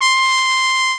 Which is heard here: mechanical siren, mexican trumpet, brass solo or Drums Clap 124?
mexican trumpet